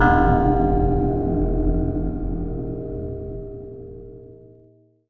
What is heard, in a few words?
bell; The Elder Scrolls III - Morrowind; Morrowind GOTY GOG